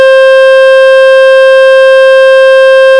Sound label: tone